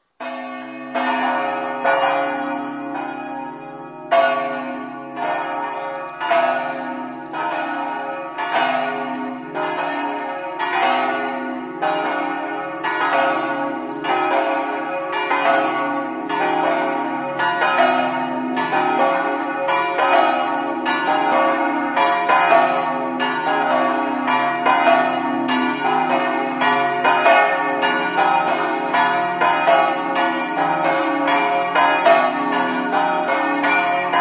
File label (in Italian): campane; pinzano